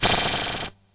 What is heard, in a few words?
Bent Cymbal; glitch; Bent Hits